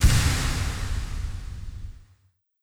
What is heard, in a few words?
Heartpiece Puzzle Bit